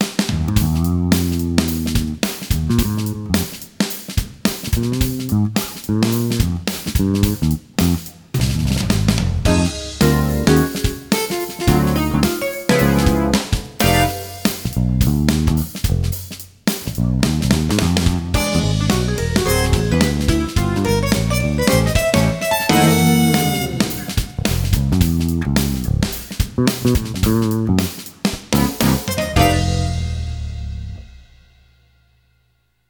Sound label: Cool Jazz